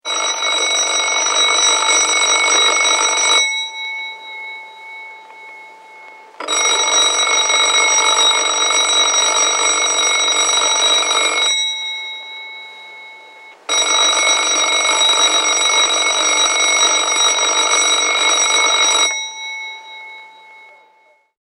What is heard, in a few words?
School Hall Bell; Bell Sound Effects; Musical